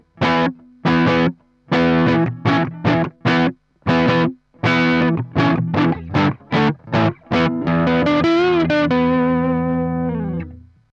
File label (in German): crunch hals